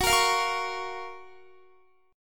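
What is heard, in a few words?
G flat Major 7th G flat Guitar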